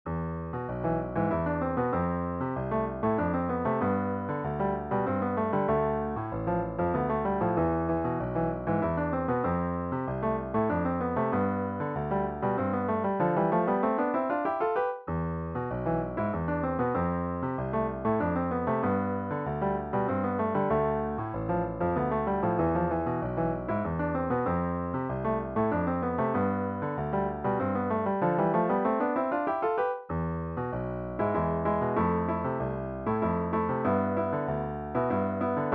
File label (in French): Melodie Hispanisante